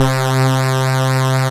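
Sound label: Analog Saw; Retro Synths; P-50 Linear; P-50 Structures; P-50 Partials; Analoje Saw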